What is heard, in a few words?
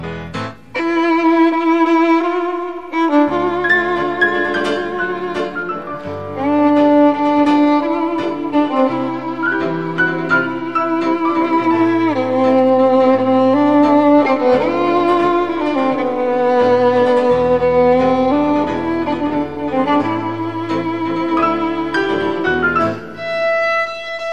Violin